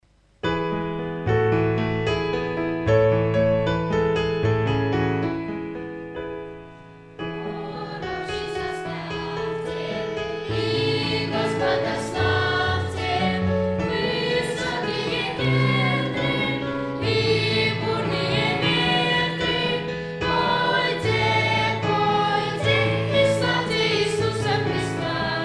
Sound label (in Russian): Детский хор